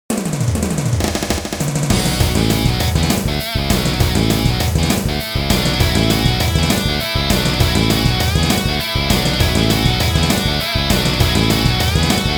Live Guitar Version; F-Zero X

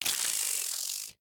Spider Attack; The Inn

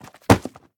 Drop Book; The Town

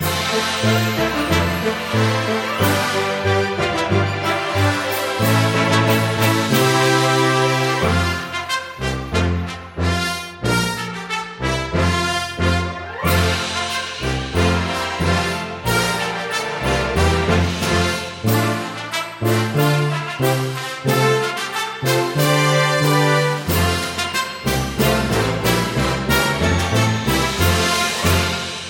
Fanfare Loop; overcooked all you can eat 2020

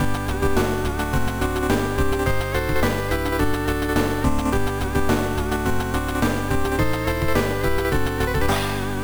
Refuel (Counting Bonus Points)

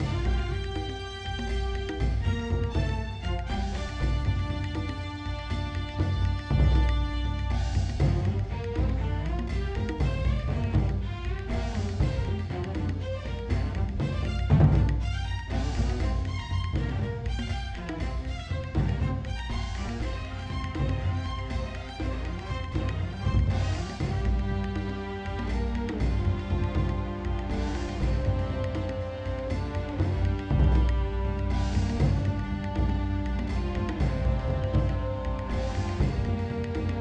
Boss Music; crouching pony hidden dragon

